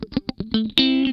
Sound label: Funk Guitar; Zero G - Funk Guitar